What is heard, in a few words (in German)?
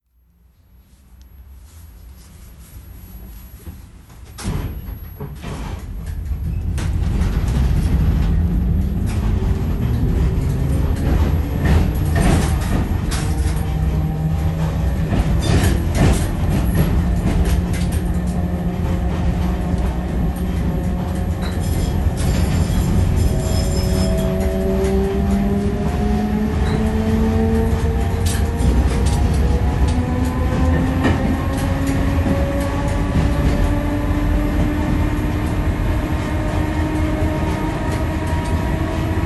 Fahrsound K Wagen